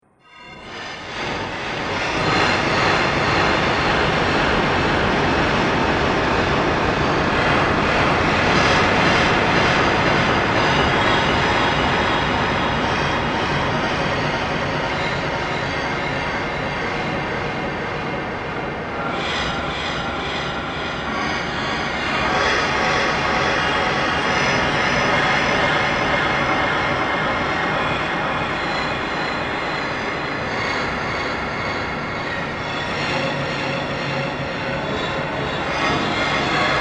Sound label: Horror Drone Mines Of Dark Fantasy, Scary Soundscape Sound Effects